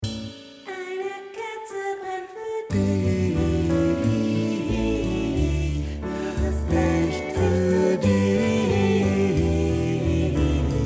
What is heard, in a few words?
Bass